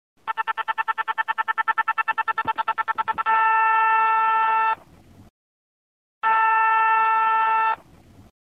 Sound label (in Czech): vyzvaneni